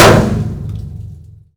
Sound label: Metal barrel hard; Doom